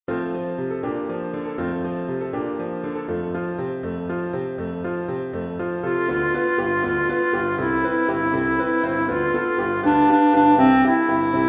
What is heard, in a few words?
Tenor; gondoliers; So goodbye, cachuca